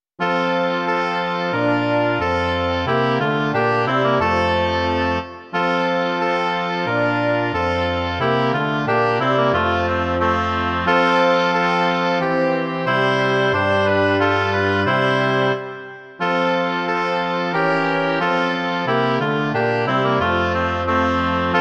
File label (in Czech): 2 hoboje, lesní roh a fagot; Ó Ježíši, ty jsi z vůle Boží; Imaginativní verze